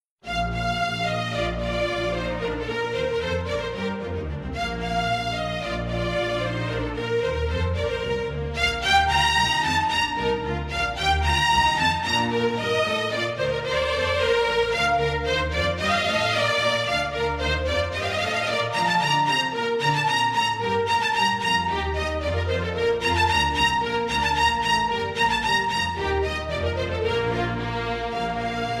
Instrumental; Pergolesi; Stabat Mater

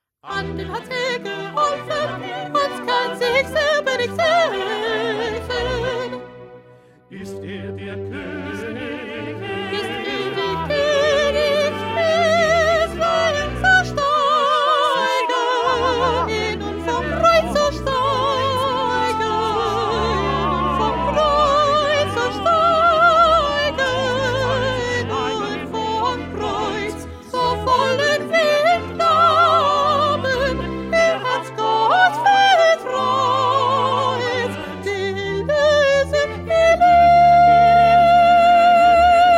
2nd Soprano; Bach-St-Matthew-Passion-67b

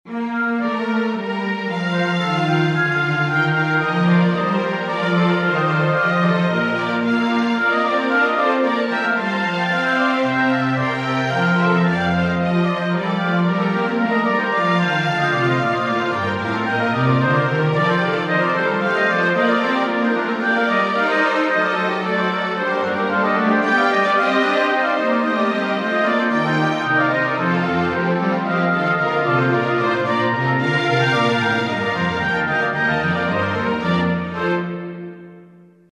Instrumental; Bach; St John Passion

